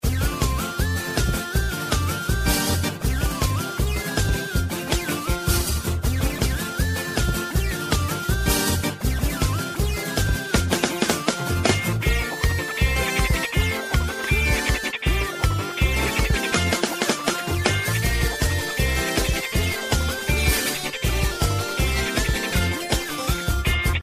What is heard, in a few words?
ringtone; abria kadabria